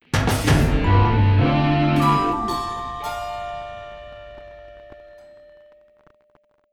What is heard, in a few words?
Win; Colt